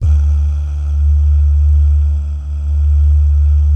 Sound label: BS BAH; ILIO; BAHS GUYS